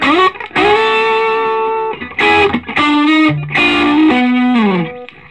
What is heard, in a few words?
SOLO, Vintage Blues Guitar; USB Soundscan vol.22